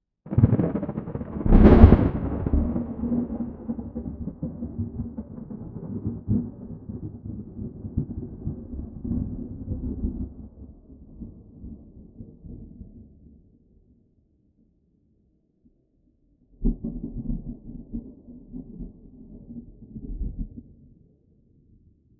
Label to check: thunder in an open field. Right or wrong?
Right